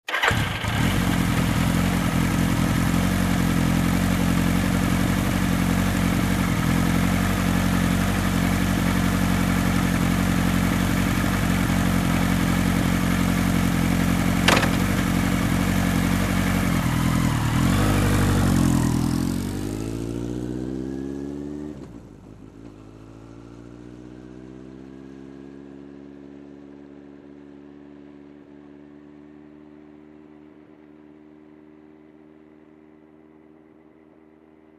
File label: Motorcycle engine ignition and driving away